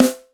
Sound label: round acoustic snare sample b key, snare drum tuned to the B note